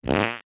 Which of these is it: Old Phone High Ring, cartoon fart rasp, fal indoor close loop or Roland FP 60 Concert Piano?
cartoon fart rasp